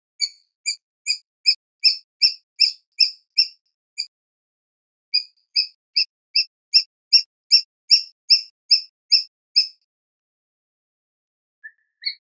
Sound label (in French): Epervier de Frances, oiseaux de mayotte; oiseaux terrestres; faune de mayotte